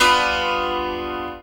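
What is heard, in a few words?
ZIMBAL PIZZI; Best Service; World Colours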